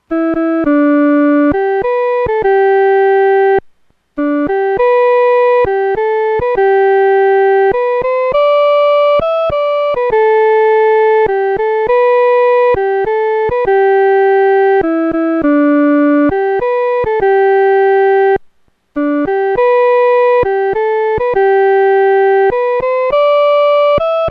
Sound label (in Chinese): 独奏 第一声